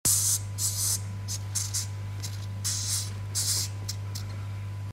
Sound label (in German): Fauchen einer Madagaskar Fauchschabe